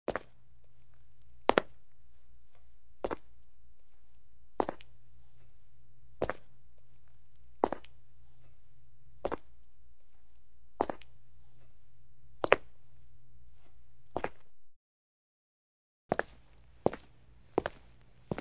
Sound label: Steps on the brick floor in shoes